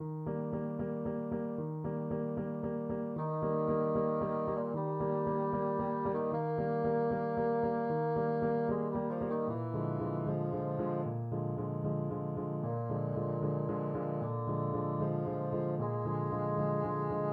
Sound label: mozart concerto 21, bassoon